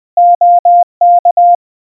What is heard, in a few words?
OK, --- -.-, Морзе; Подтверждение; Генератор азбуки Морзе